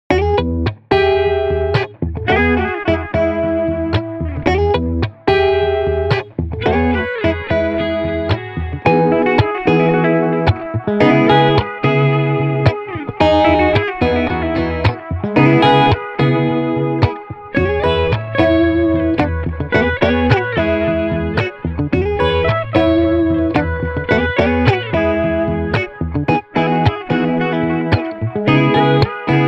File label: Street Guitars